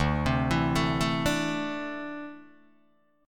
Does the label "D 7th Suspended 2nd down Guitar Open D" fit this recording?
Yes